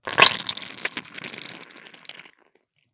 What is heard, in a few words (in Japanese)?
射精音; 搾精悪魔ちゃんの5日間寸止めゲーム～最後の日に失敗しちゃったら惨めな結末を迎えるよ～